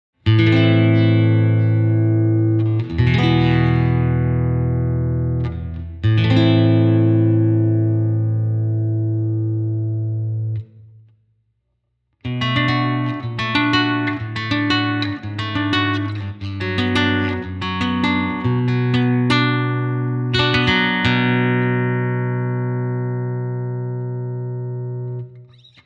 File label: Fender Japan STCL 100 Cord